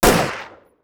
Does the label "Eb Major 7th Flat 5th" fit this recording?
No